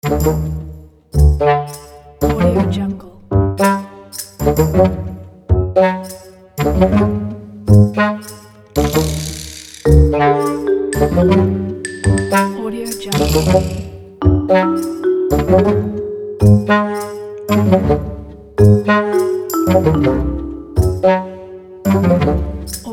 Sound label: comedy secret game cartoon